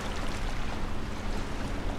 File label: lake waves